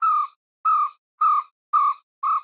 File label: Amb Env Bird-Coo; Botanist